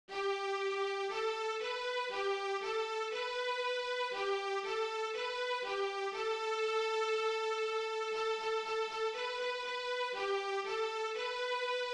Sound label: Sightreading Thumb Position, Bass; Bass for Camp; More Positions